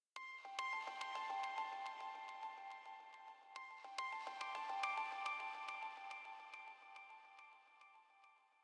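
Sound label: Tension Melody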